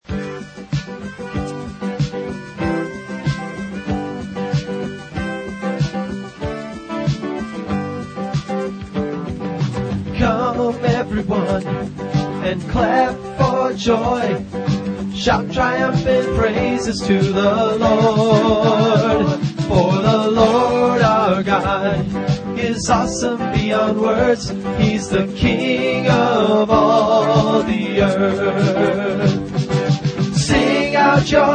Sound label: clap For Joy; praise & worship songs